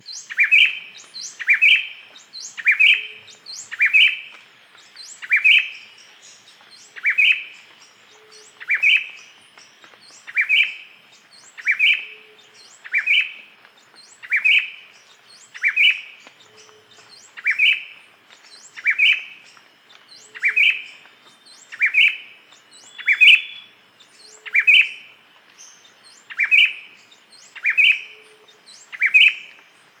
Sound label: Henicorhina leucophrys